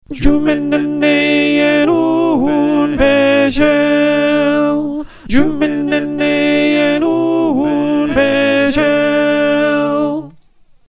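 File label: Alto, choir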